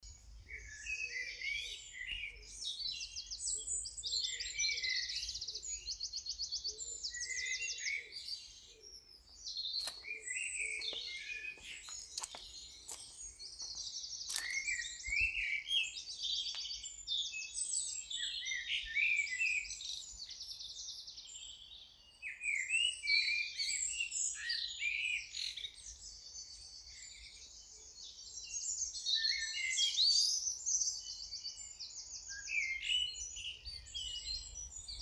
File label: Bird song